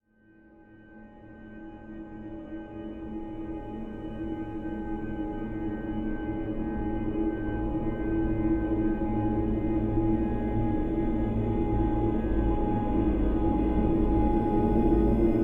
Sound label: dark ambient horror soundscape